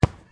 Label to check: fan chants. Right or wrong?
Wrong